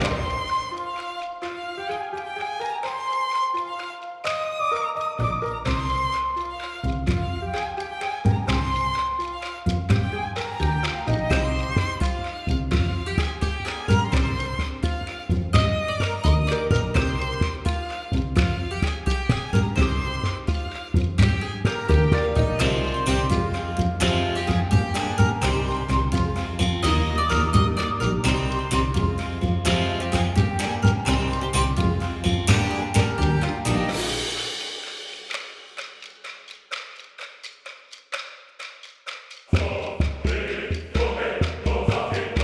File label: adventure loop; Pirates; Adventure Time